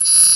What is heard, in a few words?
ALTO SAX